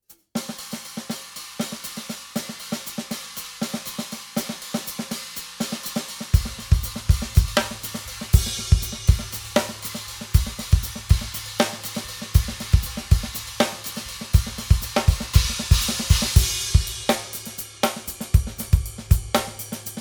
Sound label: UFIP Bionic Series